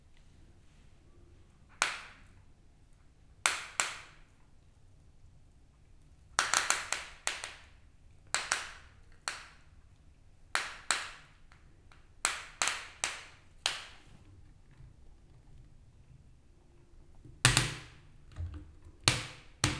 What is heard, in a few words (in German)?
pfropfen; Fieldrecordings